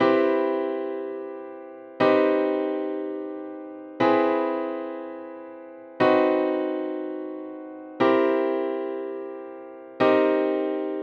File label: chords 3 notes